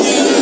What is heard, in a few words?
ELECTRO; HIGHTECH